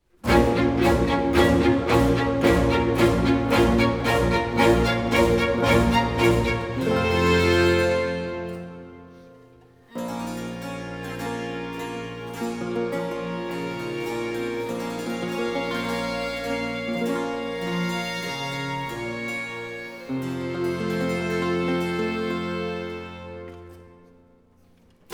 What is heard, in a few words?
Violin concerto in B minor, Andante molto; Vivaldi; I Concerti dell'Addio; Fabio Biondi; Europa Galante